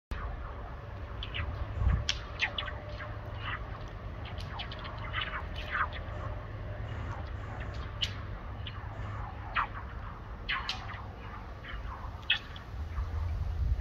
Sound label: Metal wire